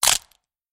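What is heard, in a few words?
broken neck sounds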